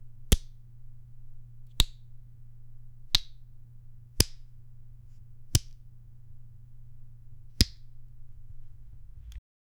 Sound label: sharp distinct gritty sou; clench